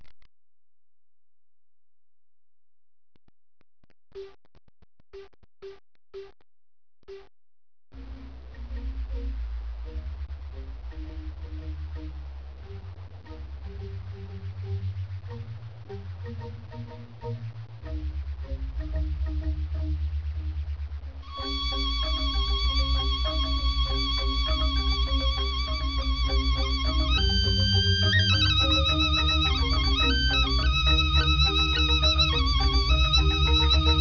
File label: tabuh teruntungan (8bit)